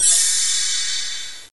shrine; garrysmod

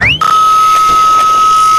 gol death; garrysmod

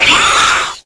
alien pain; garrysmod

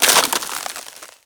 freeze over; garrysmod

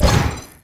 blink; garrysmod

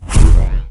pick health; garrysmod

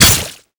kata hit flesh; garrysmod

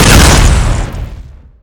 flak nade; garrysmod

